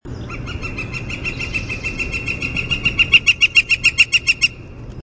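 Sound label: Northern Flicker long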